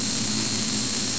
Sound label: security camera buzz